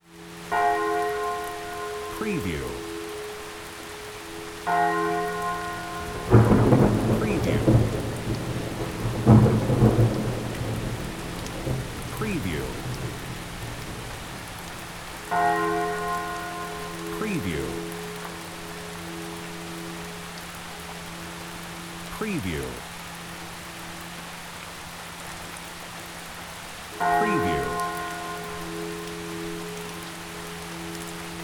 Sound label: rain thunder church bell